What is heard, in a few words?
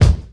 bumpin bass drum sample a sharp key; kick drum samples